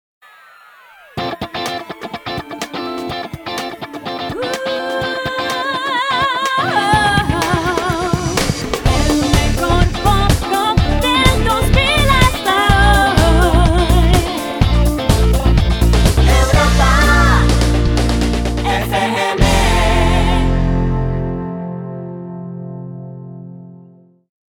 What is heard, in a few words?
funk